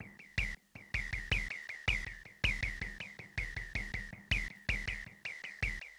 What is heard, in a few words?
DRUM LOOP ambient 160